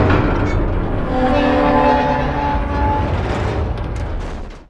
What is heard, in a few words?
random noise; titanic